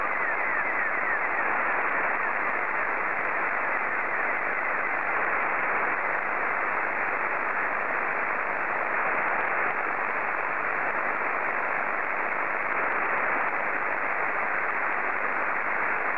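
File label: SSTV, Scottie 2; JA3OEN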